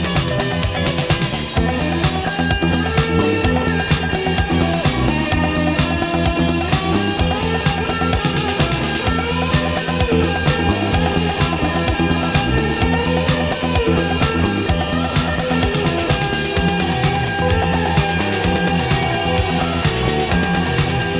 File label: git solo